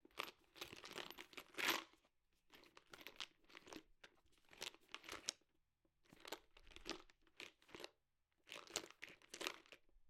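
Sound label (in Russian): небольшой контейнер с вещами зашуршал и затрясся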